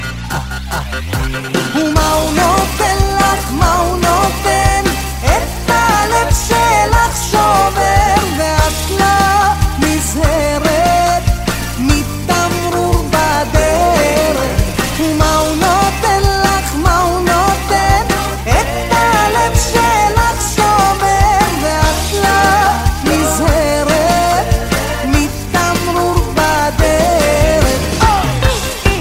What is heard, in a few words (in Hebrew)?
gitara